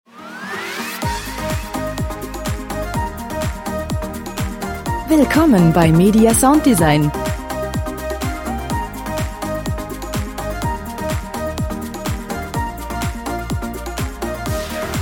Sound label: beach lounge